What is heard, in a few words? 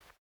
footstep burlap cloth jump start; Rust